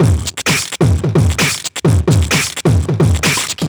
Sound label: Beatbox Loops, Human Beatbox; Vengeance Samples; Special Sounds